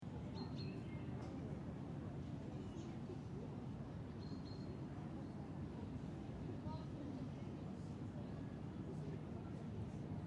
City Calm Birds Some Voices, General quiet city park ambience. Very muffled voices mumbling; A confined feeling with a sense of motor traffic in the distan; City Sound Effects